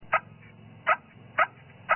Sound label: cluck, bowhunting